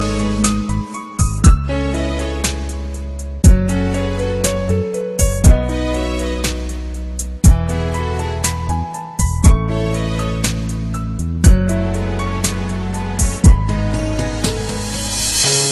Piano

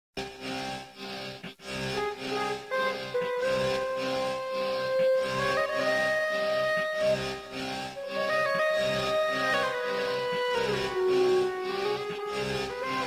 Instruments

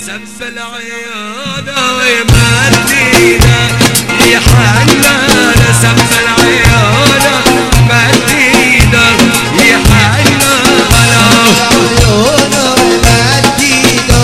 Dabka

